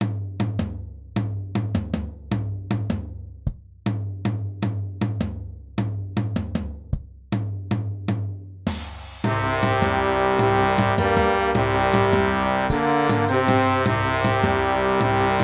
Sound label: Sea Songs